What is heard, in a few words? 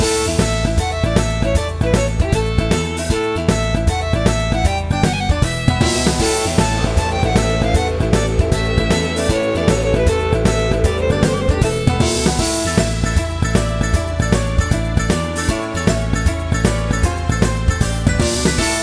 celtic fast